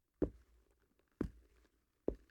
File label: Very Slow Footsteps Hardwood